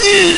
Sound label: fall